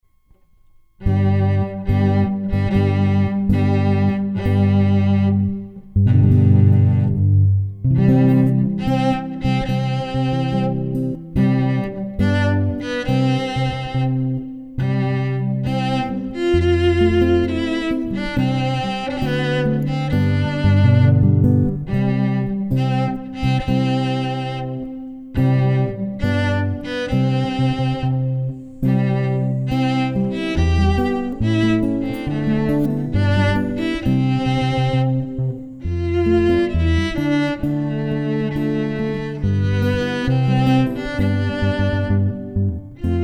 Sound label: cello bridal